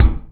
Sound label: CLANG; E-MU Producer Series Vol. 8 – Platinum Phatt